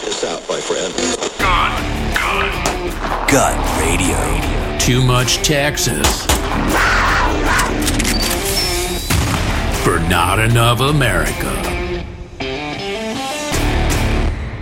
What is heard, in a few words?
Gun Radio